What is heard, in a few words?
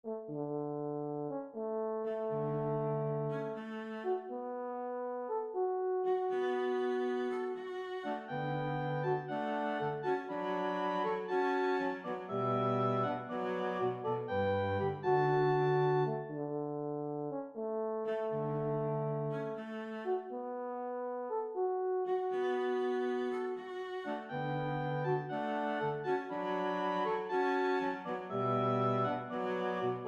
Oboe Horn Cello